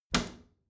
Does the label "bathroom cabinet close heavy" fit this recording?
Yes